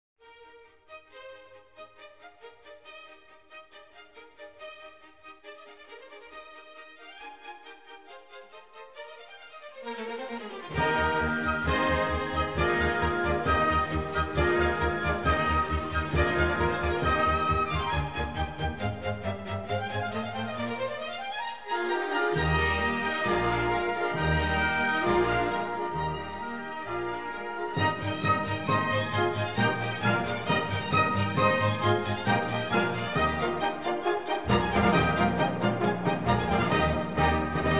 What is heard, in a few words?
Concerto for Trumpet in E Flat; Haydn